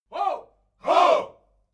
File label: military shout ho